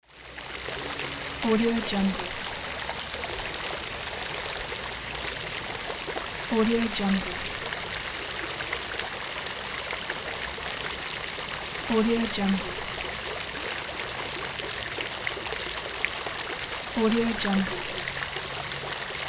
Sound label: fountain